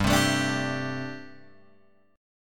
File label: G Suspended 2nd Suspended 4th G, Guitar, Open G